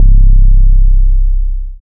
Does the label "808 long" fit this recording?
Yes